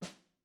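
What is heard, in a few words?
snare piano, marching; Membranophones; Struck Membranophones; Legacy Snares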